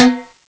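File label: TIMBALES; CMI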